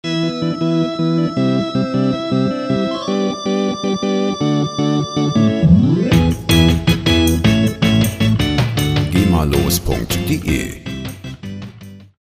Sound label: combo orgel